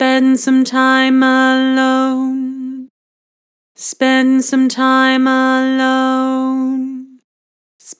Vibrato Spend some time alone, Harmor Vocals